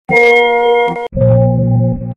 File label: windows 2 03